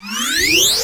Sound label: Cross line; Fly For Insanity